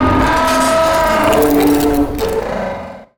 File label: wooshes bent metal; Whooshes